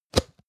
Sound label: plastic can small lid open hard